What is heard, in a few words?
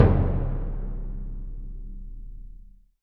PRC CBD, Orch Bs Drum